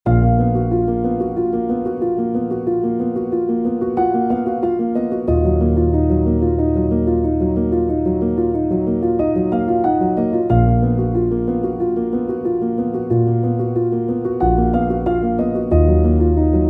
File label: Melody 90bpm